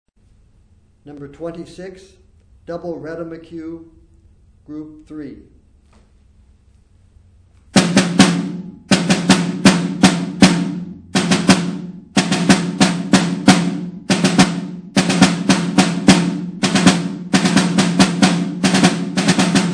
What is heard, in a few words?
snare rudiment